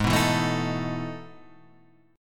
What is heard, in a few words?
E Major 11th chord